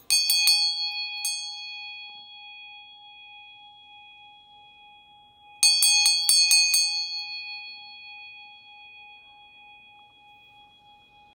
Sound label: Ghanta and Vajra big